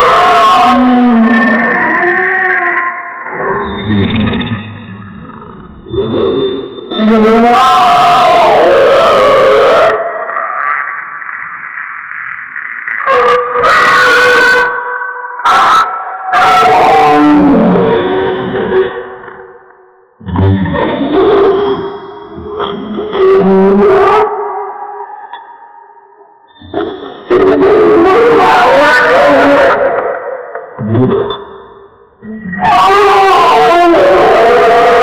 dinosaur voice and terrib

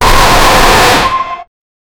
mechanical

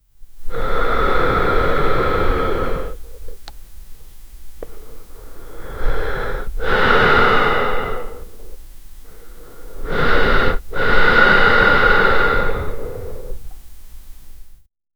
slowly breathe out throug, relaxing

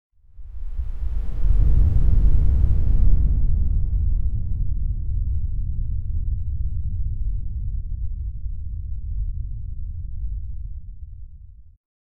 distant explosion

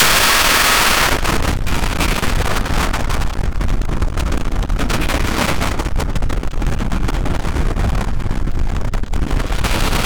big gorilla sound, jungle

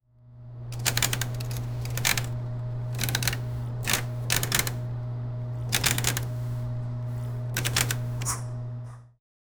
turn on system activated, robotics

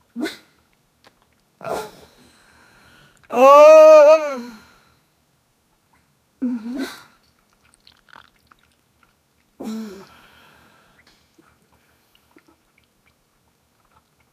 young man vomit water

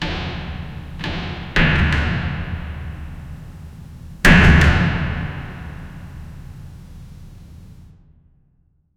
sound of windows slamming, shut

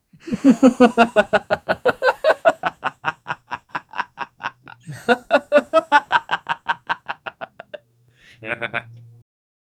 natural friendly duet lau, adult

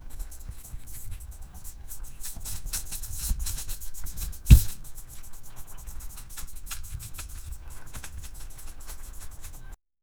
card sound but not shuffl

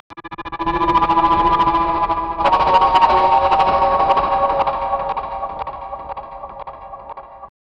suspenseful sfx for a cou, courtroom

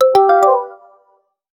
game level fail sound; alerts

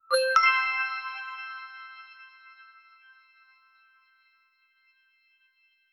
playful reward drop sound

